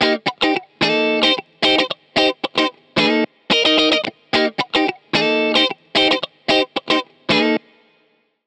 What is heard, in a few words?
Funky, ringtone